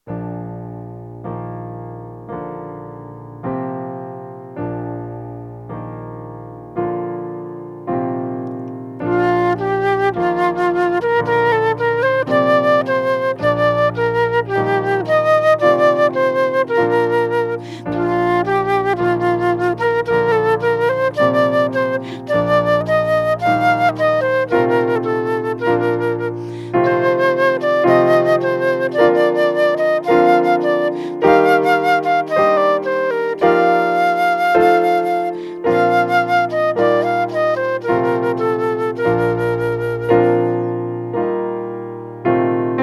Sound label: 2 flutes piano; God of Grace and God of Glory